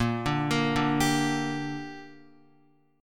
Bb 6th Bb, Guitar; Bb6 arpeggiated

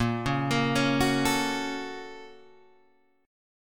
Bb Minor Major 7th Sharp 5th, Guitar, Open G

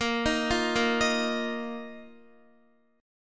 A sharp Suspended 4th Guitar; A#sus4 arpeggiated; x,13,13,10,10,10

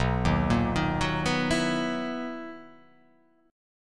B Major 11th B down

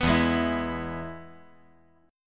E-Major E

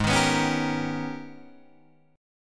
Ab Minor Major 11th down Guitar Drop D; strummed; JGuitar

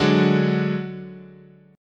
D Major Flat 5th, chord

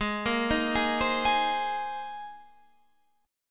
Bb 7th Suspended 2nd Suspended 4th Ab

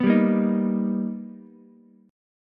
B Augmented Major 7th B Ukulele, chord; B+M7; strummed; JGuitar

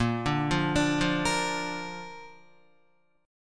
A sharp Major, Guitar; arpeggiated; JGuitar

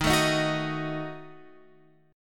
D sharp Diminished D sharp

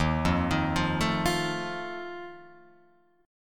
Eb Suspended 2nd Flat 5th, Guitar, Open F; arpeggiated; chord; JGuitar

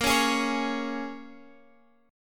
A sharp Minor 7th A sharp Guitar, chord; A#m7; strummed; 18,16,18,18,x,x

